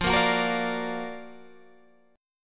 F sharp Augmented Major 7th F

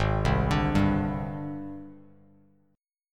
G sharp Major Sharp 11th, Bass Standard; G#M#11 arpeggiated; 4,3,12,0; JGuitar

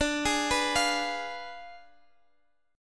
D Diminished 7th Guitar DADGAD, chord

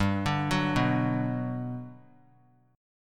G Major 7th, G, down; arpeggiated; JGuitar

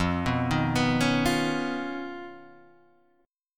D sharp 7th Suspended 2nd Sharp 5th F

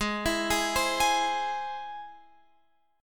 G sharp Augmented G sharp Guitar Open F; G#+ arpeggiated; x,15,16,15,15,15; JGuitar

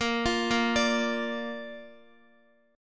Bb Major Bb down Guitar; arpeggiated; JGuitar

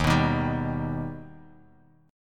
D sharp Augmented D sharp Bass Standard; D#+ strummed; JGuitar

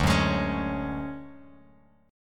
D-Major Sharp 11th, D, Bass Standard; strummed; JGuitar

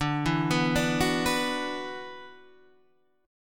F sharp 7th Suspended 4th Sharp 5th D

